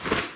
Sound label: Grab